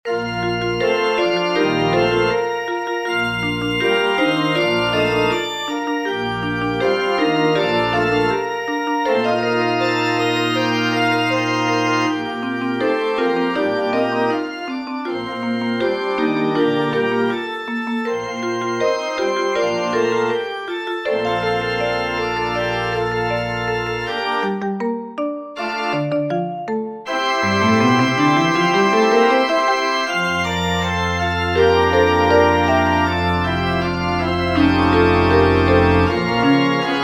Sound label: interlude pipe organ marimba vibraphone